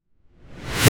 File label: layered 8 bit whoosh up, square